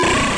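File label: c64 SID random, Commodore 64 8bit SID Drums; LO FI and 8 BIT KITS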